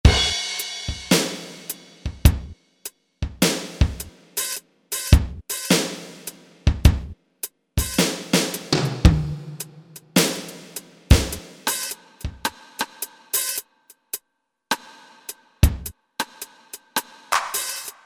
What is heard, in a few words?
Standard Set; Roland U-220; Factory Patches